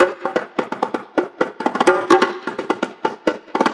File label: Latin House Loop 128 BPM; Vengeance Samples